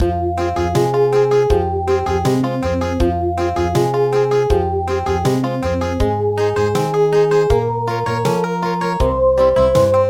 Country; Armenian lullaby

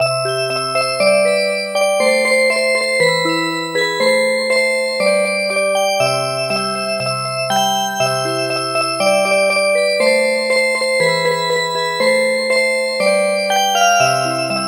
Music Box; Along the river

